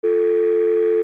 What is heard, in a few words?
PHONES